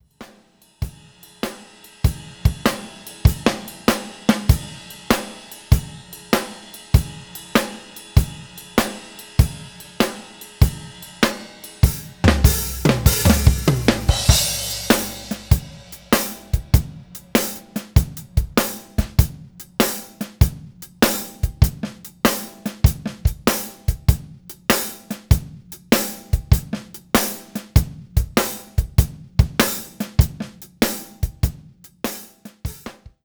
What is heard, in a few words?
shuffle